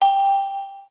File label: ding